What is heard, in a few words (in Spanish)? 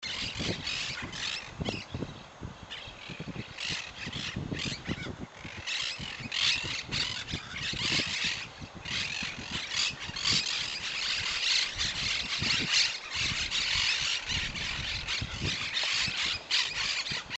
cotorra, Myiopsitta monachus, Monk Parakeet; cantos